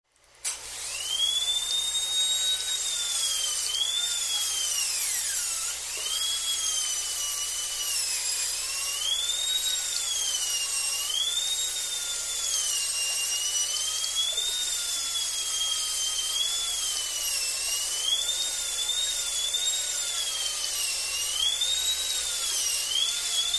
Dentist's drill; SOUND EFFECTS1

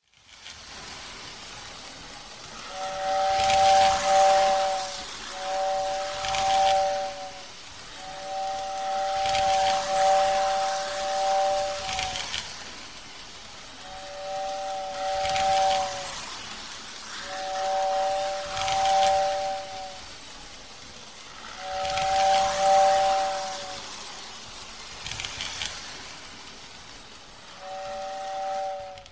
Model Electric Train; SOUND EFFECTS1

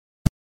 Bullet Impact Into Dirt; Crashes Impacts

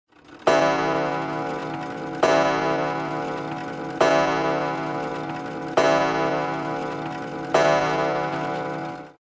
Chiming Clock; 100 sound effects

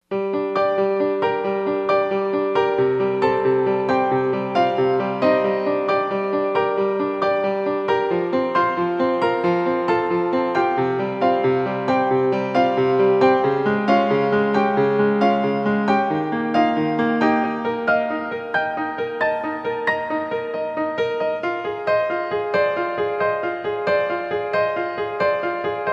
Prelude No. 13 in G Minor, Solo Piano

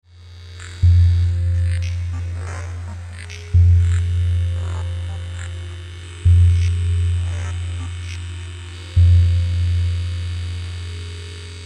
Soundtrack

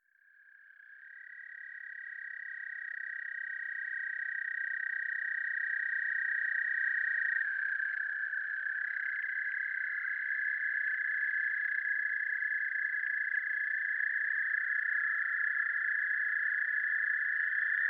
American toad, common loon

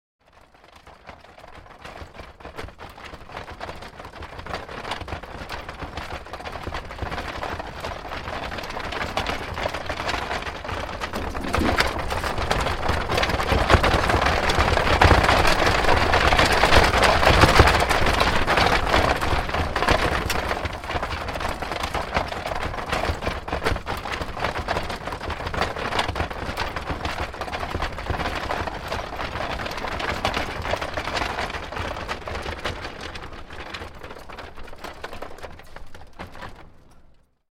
Horse Drawn Carriage Up Cons; Horses Carriages and Traps; Hollywood Edge Historical Series

